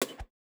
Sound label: slot reel bounce